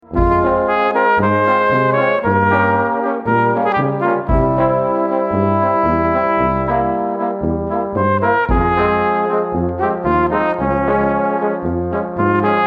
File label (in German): Blasmusik in Moll